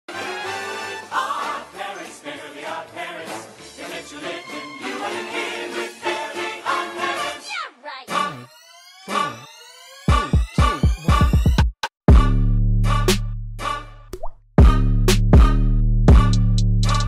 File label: the banjo beat